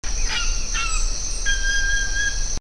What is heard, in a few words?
Syrigma sibilatrix roost pipe